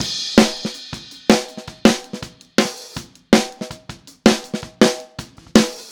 Snare, 162 BPM; Verse; Drums

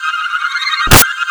FIREFLIES, PIXIES; Spectrasonic Distorted Reality 1

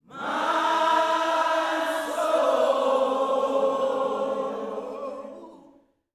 MY SOUL, Vocal Planet Gospel; Spectrasonics; GOSP PHR

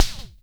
OINK; Spectrasonics; Liquid Grooves; WAVDRM HITS1

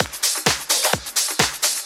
Discohouse Loop 128 BPM

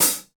Brush Closed HiHat, Roland BK-5

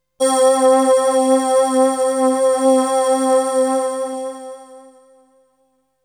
Melody oneshot; ZERO G - Datafile DISC 2

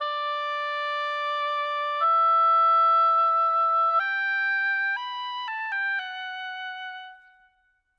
Oboe, Orchestral; Sonic Foundry (Sony Creative Software); Orchestral VOL-2; Modern 01

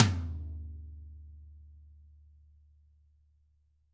DW 14 HIT, Double Platinum Drums, BRUSH; ILIO; DW+DW

